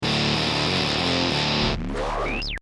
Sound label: guitars plus; mchn